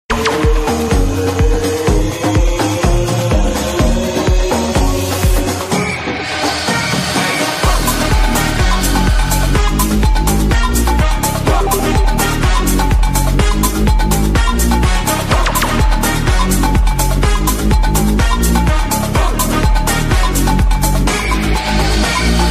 Carnival Call; Pubg

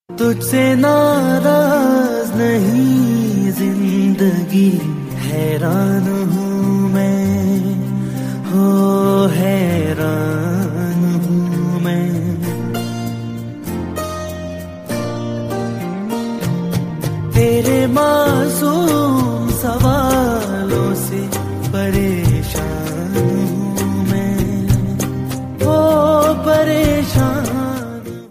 Music

